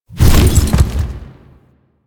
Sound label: Horse Jump Barrel Roll; Foley Actions; Warframe; Vehicles; Duviri